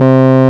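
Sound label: RMI EP piano C 2; Sonic Reality - Vintage Timetraveler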